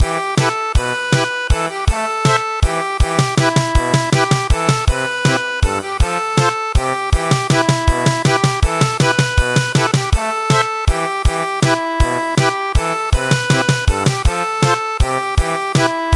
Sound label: German Polka, midi; Abu abu abua